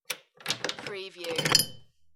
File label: Heavy Key, Move And Pull From Lock